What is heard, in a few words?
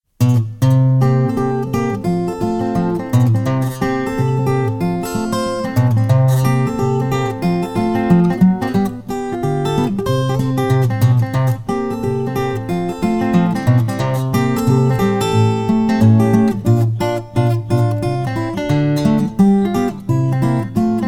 Ragtime